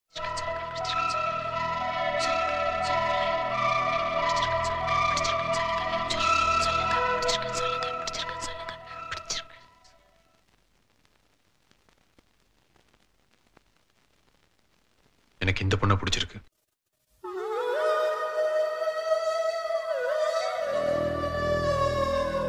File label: song bgm tamil love; roja